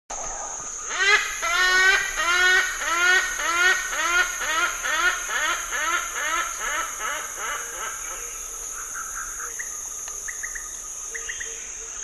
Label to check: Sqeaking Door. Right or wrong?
Wrong